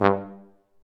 F TUBA, Solo Brass, Tuba stacc

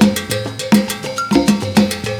LATIN LOOP; Crypton - DrumBase5000

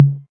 tom mid, Novation Drumstation

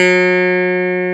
CLAV B2; AKAI S6000 CD-ROM - Ultimate

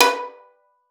B4, VIOLIN PIZZ; Xsample VOL-5

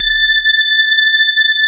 sparkles

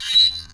PULVERIZ, Anime Sound FX; AKAI; FX SIGNALS

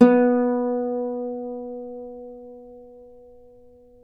Celtic Harp, Plucked Strings

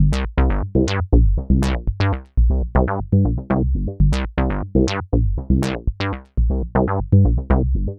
Bassline, Prototechno

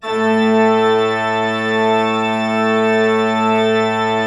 A2, Cathedral Organ

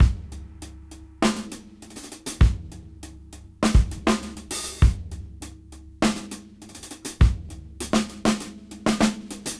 Half Funk 101 BPM; AMG - Keith LeBlanc Phat Butt Jams

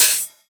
Open HH, Cymbals

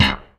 Single Acoustic Basses

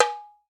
TAMBORIM, Latin Hand Perc; E-MU Producer Series Vol. 2; More Studio Essentials; Composer

